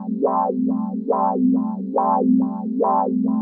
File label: TECHNO PAD 140; Keys